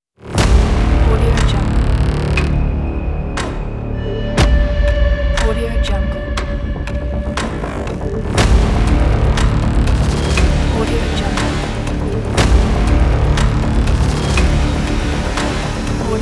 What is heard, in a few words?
countdown trailer ident; soundbay